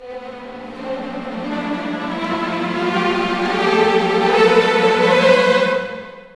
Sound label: OPEN; East West; Scoring Tools; ORC; Open Theme